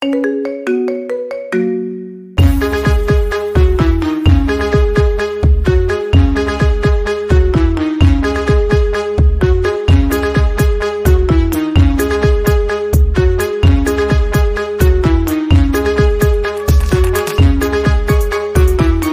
nada dering marimba remix; hits di TikTok

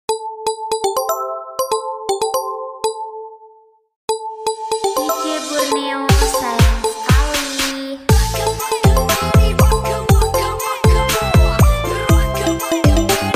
nada dering realme keriangan; DJ remix TikTok

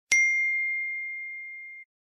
nada notifikasi ting iPhone; notifikasi WA iPhone ting; nada dering wa 1 detik ting

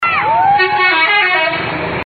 nada dering klakson telolet pendek; Klakson Telolet pendek (Versi 3)

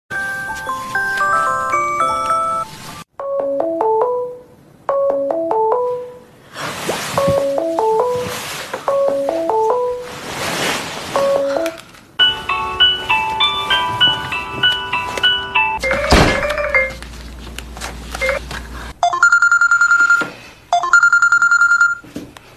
nada dering Samsung; Samsung yang sering dipakai di drama korea

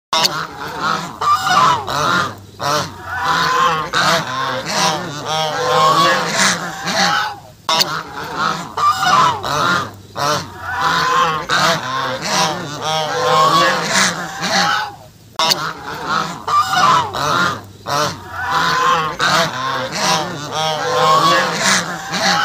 suara angsa marah

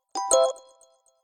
nada notifikasi hello iphone

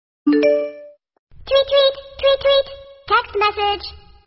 nada notifikasi wa cuit cuit text message

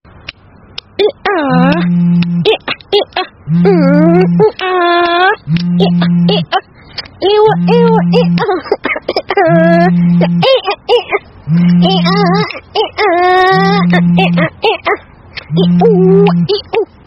nada dering suara eah eah eah; Eah Eah Eah, iah iah iah; lucu

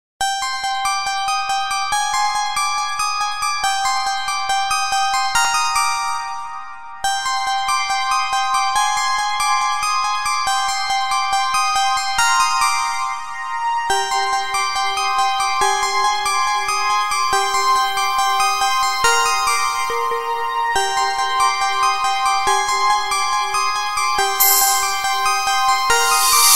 nada dering nokia songette original; asli (ringtone original)

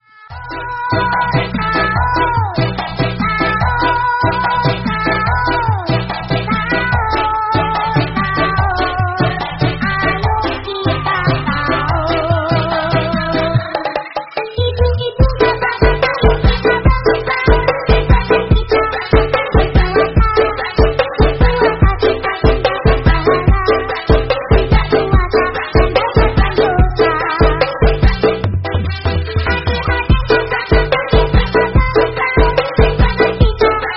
lagu alarm sahur lucu DJ Remix viral TikTok

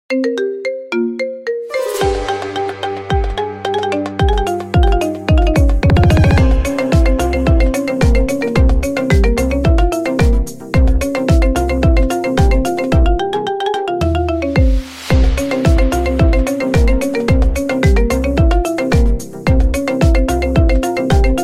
nada dering marimba ringtone; TikTok

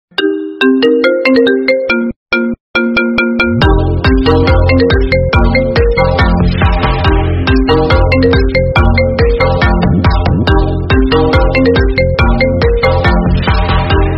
nada dering telepon aesthetic; nada notifikasi

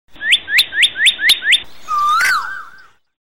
suara burung pendek

